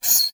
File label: Multimedia Internet CD-Rom Flash Wipe Short